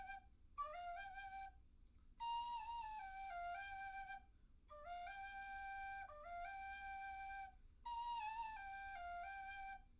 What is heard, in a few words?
nodrums liverec; SiSEC11; underdetermined